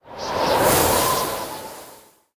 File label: Snow Wind